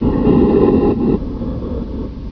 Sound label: gar breathe